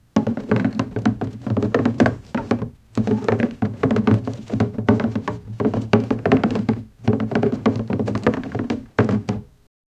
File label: the slow heavy rhythmic, wooden planks